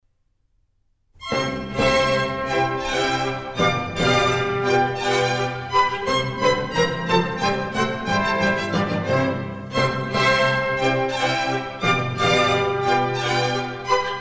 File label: German Dances, C major; Mozart; Dances and Marches